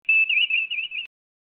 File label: viewscreen slow